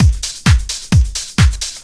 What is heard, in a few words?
drum 130 trance; Techno Trance Essentials; DRUMLOOPS